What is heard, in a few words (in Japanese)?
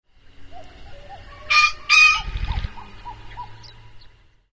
kiji; キジ; ホロ打ち; 2015年5月30日 長野県諏訪市